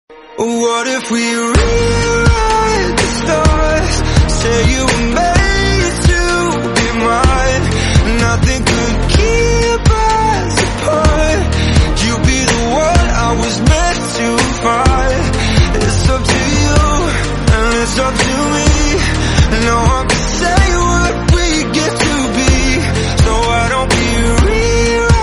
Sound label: slowed reverb english